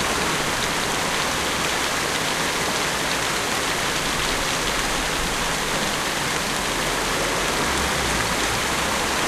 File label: Ambiance; Knytt